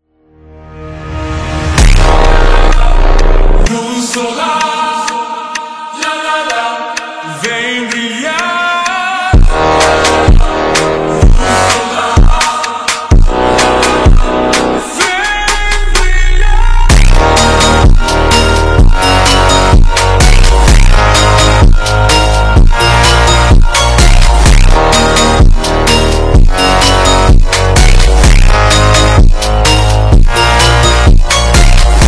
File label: Funk, Super Slowed